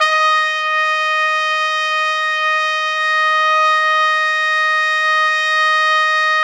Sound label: D#4, Pop Brass; Best Service ProSamples vol.19; AKAI